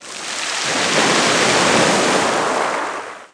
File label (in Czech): Vlny; wob2 demo